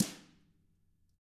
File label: TAMA BR MF, Drums; ILIO; Double Platinum Drums 1